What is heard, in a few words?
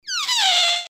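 cartoon balloon squeeze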